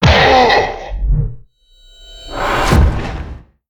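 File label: big golem boss death; monster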